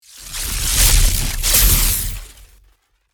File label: Unleashing raw energy, shockwave